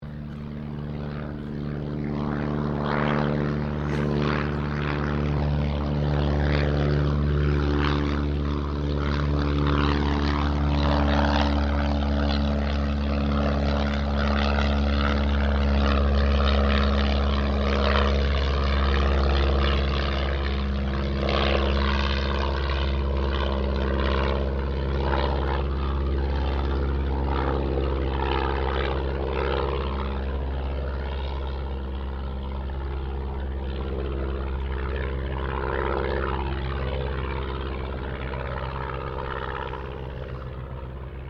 AIRCRAFT AUSTER III TAKEOFF; Airplanes; Vehicles

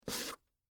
Pumps Scrape, Footsteps, Peep, Toe, Wood, Surface; BlastWave

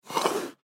Leopard Breathe Out; Wet; Animal

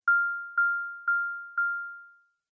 App Sounds Waiting Beeps

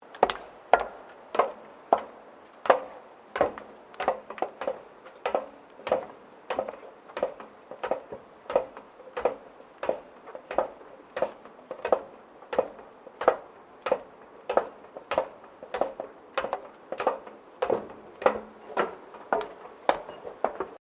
FOOTSTEPS WOMENS HIGH HEELS ESCALATOR SEQUENCE; Footstep Foley; Footsteps (Sound Effects:Human)

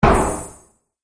Pantomime Appear Sparkle; Cartoon Sound Design Sound Effects

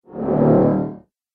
Laser Energy Element; Laser Sound

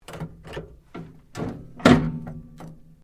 SWITCH DIAL TURN, Sounds onboard HMAS Castlemaine; Boats (Sound Effects:Vehicles)

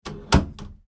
household door wooden internal shut; Door Shutting - Wooden Internal Door Closed; Miscellaneous Doors (Sound Effects:Doors); McKinney Sound

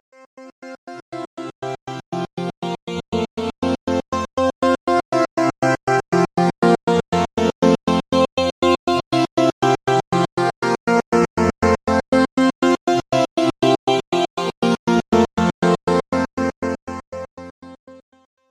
Electro Synth Intro; Musical Sound Effects; audio logo, web background, video game music

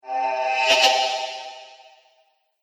Passing Dark Energy, ghost; Andrew Fleming; Twistedtracks

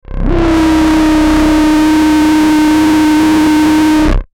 Electrical Signal Feedback Interference; Production Element Imaging Element Accent Transition

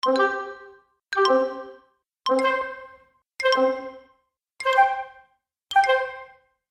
Cartoon Elements Woodwind And Xylophone; Cartoon Music Sound Effects

